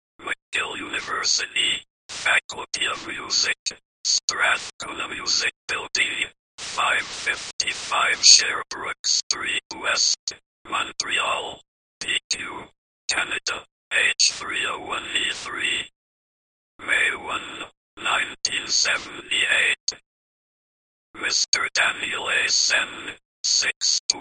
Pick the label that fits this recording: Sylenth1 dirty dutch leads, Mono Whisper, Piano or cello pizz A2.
Mono Whisper